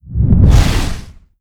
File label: Poison Breath